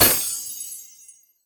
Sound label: Ice Shard Impact; Legend of Keepers (gamerip) (2019)